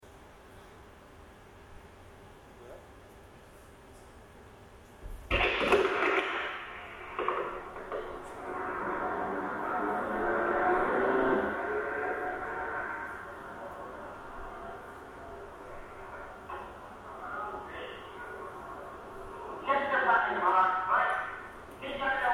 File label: musicbox, field recordings; lisboa jun; rinus van alebeek